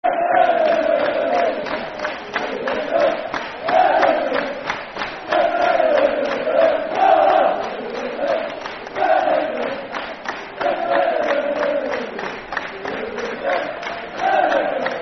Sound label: Football Chant (Soccer Songs); Carlisle United; fanchants-com